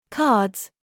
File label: cards